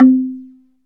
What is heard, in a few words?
Slit Drums, Africa, Wood & Metal